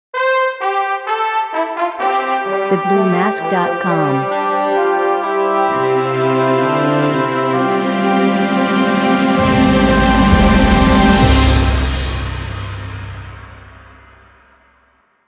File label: logo fanfare